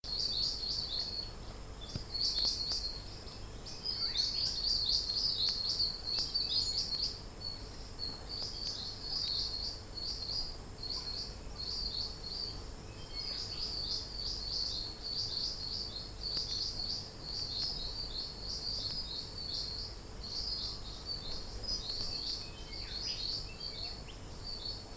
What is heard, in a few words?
Eastern spinebill